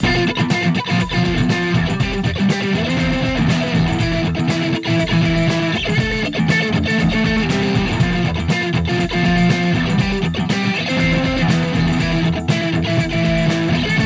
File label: octave crunch